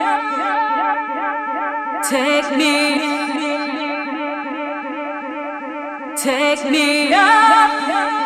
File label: dub techno loops loop